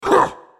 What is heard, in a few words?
effort; Renekton